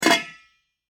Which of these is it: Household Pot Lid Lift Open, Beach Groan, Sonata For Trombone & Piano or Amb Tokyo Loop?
Household Pot Lid Lift Open